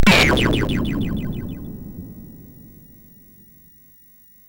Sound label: sid MOS6581R4; Demogame Example